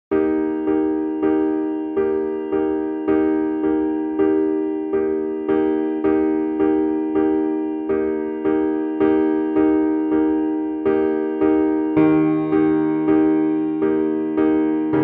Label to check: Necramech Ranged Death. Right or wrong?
Wrong